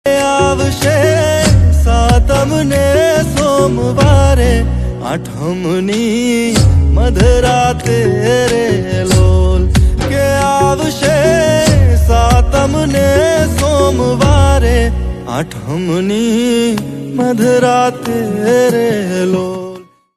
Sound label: gujarati ringtone